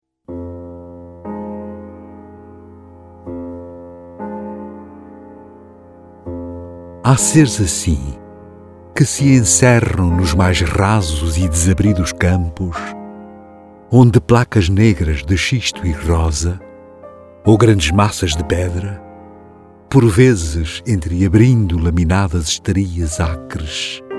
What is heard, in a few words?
piano